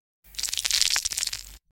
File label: giant spider legs cr